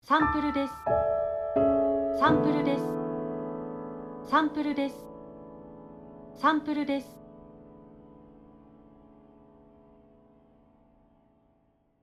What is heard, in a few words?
Logo Piano slow